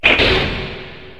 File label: Mechanical sound